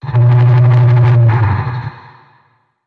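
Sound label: Blst, blast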